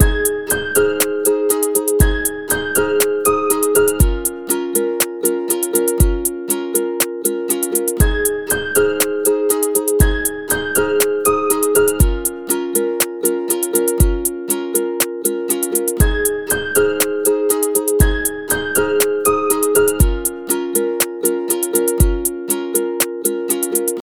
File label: lofi type beat